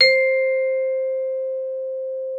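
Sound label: vibraphone C4; Xsample VOL-4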